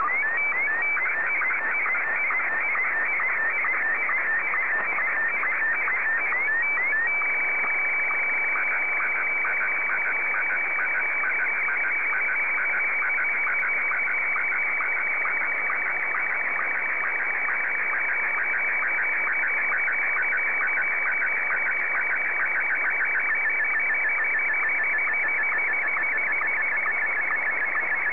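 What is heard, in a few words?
SSTV, Martin 1; ZL2TJM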